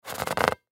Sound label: Basketball Move Fingers Rub Creak; SoundEffects-Blastwave; Sports